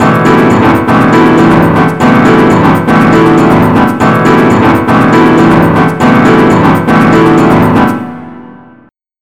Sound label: SAMBA; BUSKER L